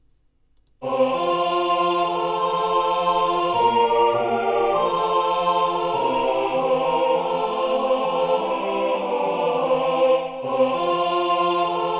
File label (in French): Le gai laboureur tutti, Chants